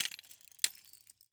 Rollerskates, old fashioned, all metal, wooden wheels, clash, strike, clatter, ping, double, light, hesitate; Eiravaein Works; Vaeyan IV